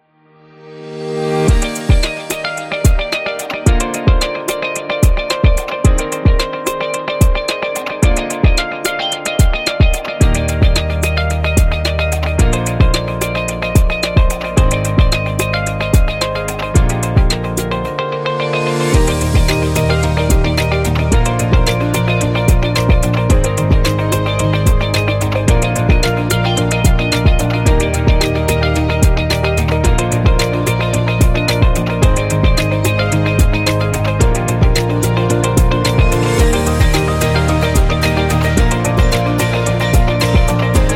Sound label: Graceful, Background music for conferences, business events, and professional presenta